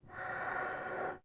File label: Gasmask Inhale; Sid Meier's Civilization 4 Complete; Beyond the Sword; Units